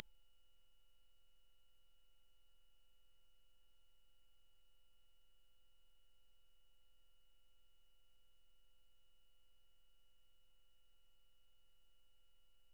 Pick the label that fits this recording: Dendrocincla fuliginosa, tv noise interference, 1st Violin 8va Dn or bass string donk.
tv noise interference